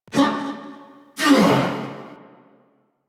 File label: Grunt; Nightwave; Season Three Glassmaker; Nihil Fight